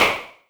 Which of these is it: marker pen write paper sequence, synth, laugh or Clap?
Clap